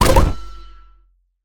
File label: Land; smite; cupid; Fields Of Love